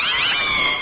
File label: laughter; deltarune